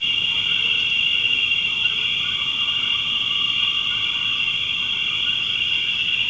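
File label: background music; Virtual Graceland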